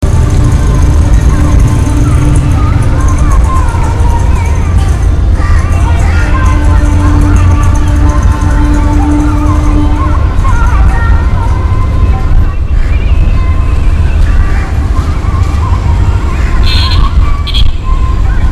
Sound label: early am sounds, india; Ted P. Eugenis